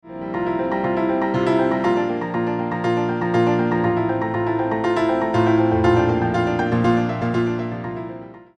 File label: Piano; Son de Piano N°3